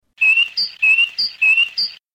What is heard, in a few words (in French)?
mesange